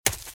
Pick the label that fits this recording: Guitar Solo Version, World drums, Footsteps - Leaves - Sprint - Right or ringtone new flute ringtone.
Footsteps - Leaves - Sprint - Right